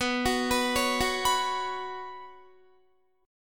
B Suspended 2nd, Guitar; Bsus2; arpeggiated; 19,21,21,18,18,18

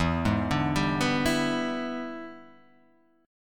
E 7th Sharp 9th, chord

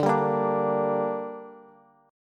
D Suspended 4th Sharp 5th D down Bass, chord; strummed

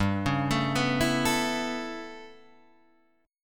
A 9th G, Guitar Standard; 3,4,7,4,5,5

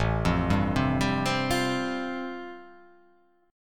A-Minor 7th Sharp 5th, chord; x,0,3,0,0,2,2,2; Am7#5 arpeggiated; JGuitar

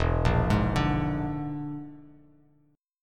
E 7th Flat 5th, down, Bass Standard; E7b5 arpeggiated; JGuitar

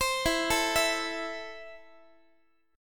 E Suspended 4th Sharp 5th E, down, Guitar; arpeggiated; Esus4#5; 4A,4E,5A,5D,5G#,6C#; x,x,15,14,13,15

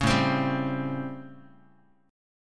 A 7th Sharp 9th, Guitar; A7#9; strummed; JGuitar

